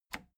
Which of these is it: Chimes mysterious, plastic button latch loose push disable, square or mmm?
plastic button latch loose push disable